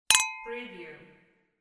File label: glass clink